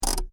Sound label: Car: Handbrake On, Interior; Vehicles; Cars; Stuart Duffield